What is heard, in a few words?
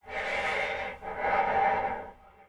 Player UI, sfx; soma; Five Nights At Akers